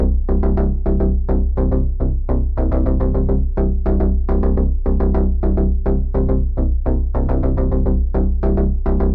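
BASS C, Organic House / Deep House Sample Pack Oriental & Middle Eastern; Azura – Organic House Sample Pack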